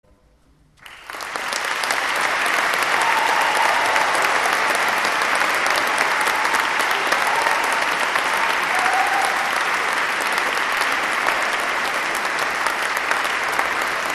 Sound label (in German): APPLAUS Unterstufenchor